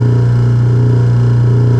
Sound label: DISPOSAL, Kitchen Counter; SFX